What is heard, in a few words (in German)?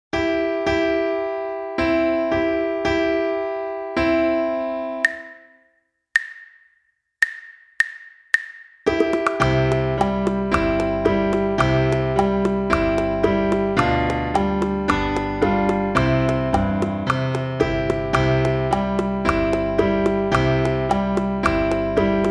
Playalong Ihr Kinderlein kommet